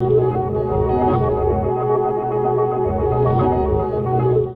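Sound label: Pad Synth; Equipped Music; Loungin House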